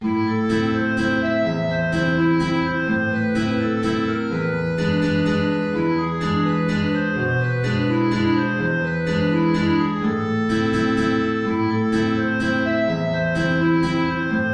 Klarinette in Bb & Gitarre (tief)

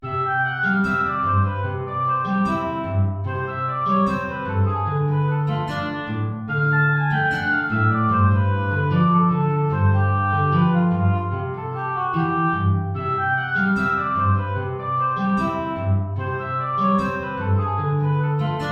Oboe & Gitarre

